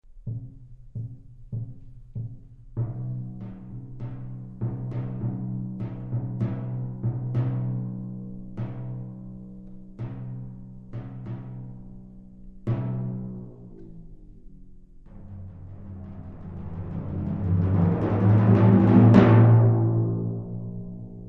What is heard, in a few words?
timpani; sequenza; bandjoun